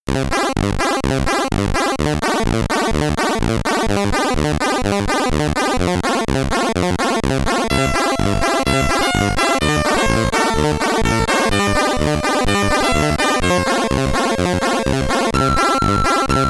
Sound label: Level Tune, SID Stereo; Forester; commodore 64